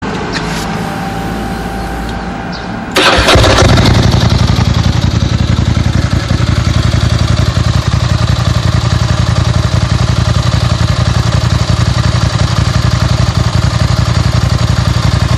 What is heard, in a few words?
Ducati ss900 remus